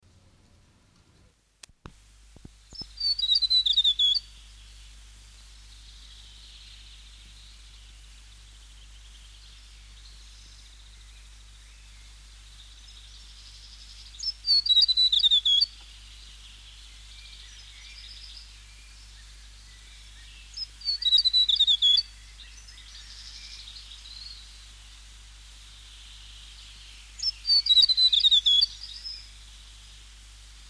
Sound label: south island tomtit song; conservation, native animals, birds, bird song